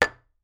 Metall

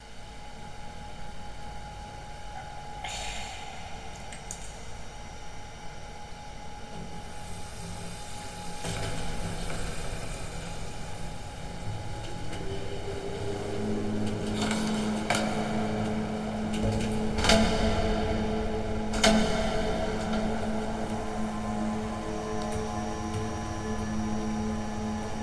Atmo Fabrikhalle Lagerhalle

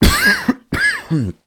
Husten tief mit Nachhall

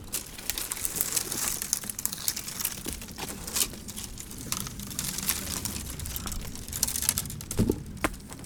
Knister

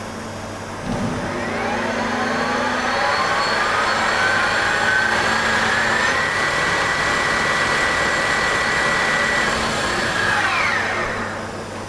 Drehmaschiene Start

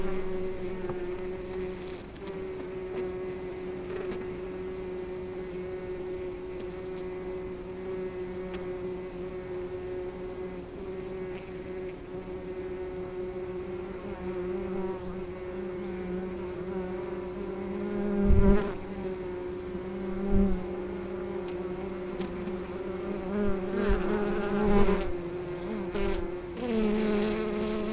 Bienenflug

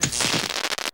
Kurzschluss

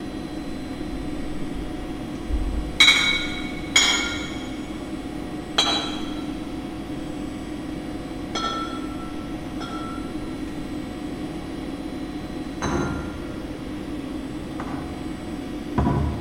Atmo Fabrikhalle Lagerhalle

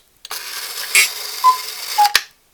Kuckucksuhr

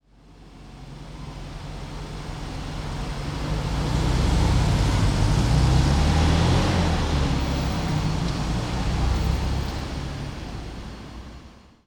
Fahrzeug

